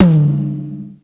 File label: Tom, breakcore Drum Hits